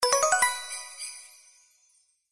Win Symbol Scatter; slots; Christmas Blast

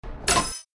BTN Click; slots; Realm Saga

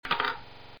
ball Bounce, Roulette

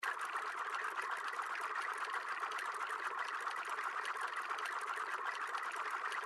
Spin; slots; Aztec Fate

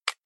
BTN Next; slots; Christmas Blast

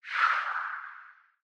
EFX SLIDE TREASURE, slots; Monkey Spin

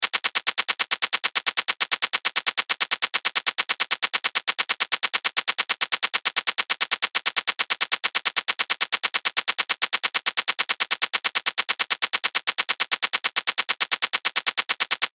Reel Spin; Pirate Quest

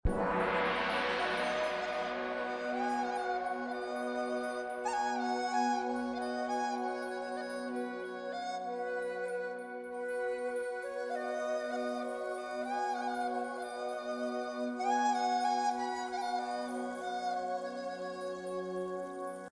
BGM Lucky Pick; slots; Panda Majesty2

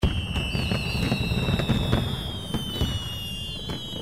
big Win Fireworks, slots

